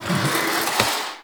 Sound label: Classroom Chair Drag; Ambience; Warframe; TheNewWar; Zariman